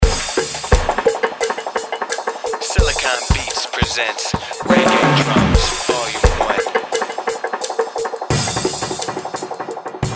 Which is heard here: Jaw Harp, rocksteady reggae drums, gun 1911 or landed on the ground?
rocksteady reggae drums